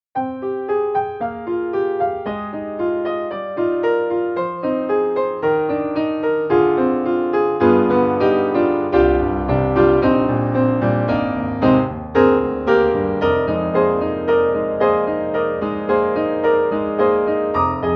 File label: EXS24 mkII, Classical Piano; Apple; Logic